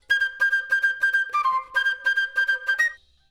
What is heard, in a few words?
Flute